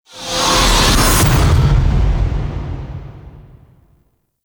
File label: Beam Swipe Cast; Enemies; Abilities; Ethereal; Warframe; Zariman; Void Angel